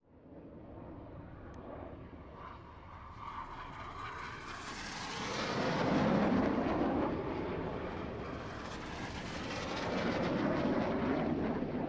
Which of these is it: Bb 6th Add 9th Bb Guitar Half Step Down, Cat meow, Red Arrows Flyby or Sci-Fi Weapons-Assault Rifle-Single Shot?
Red Arrows Flyby